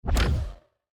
environment successful build low

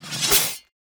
lycanthrope strike

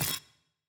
ambience room dark temple ritual stone ready

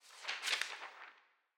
witch lodge book

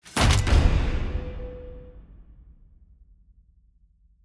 arena start battle

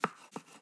ambience room butchery food ready

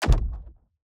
environment block destruction kick

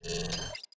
Drone Dash; TANK; 3Souls

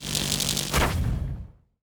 electric golem strike

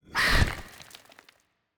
Unstable Bat Rock Item Pick Up; TANK; 3Souls

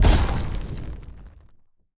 Bone Golem Armor Break; TANK; 3Souls

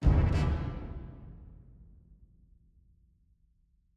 battle autobattle begin

